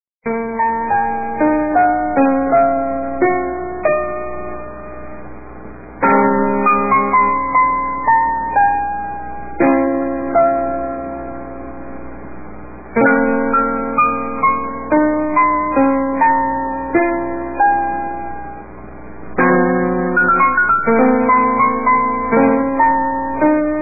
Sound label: piano